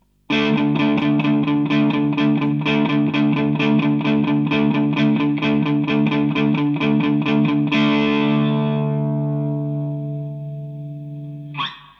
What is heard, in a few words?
crunch riff